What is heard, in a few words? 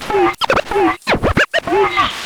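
scratch, record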